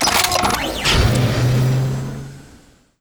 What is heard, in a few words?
rocket pack boosters extend; tf2